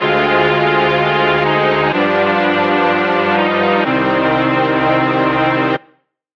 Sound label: Game Over Lose